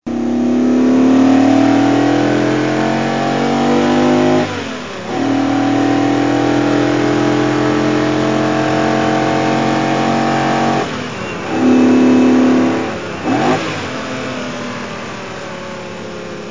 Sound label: ferrari V12